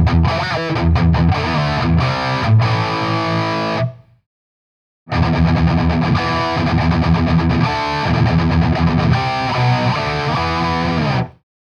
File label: metal riff with intro E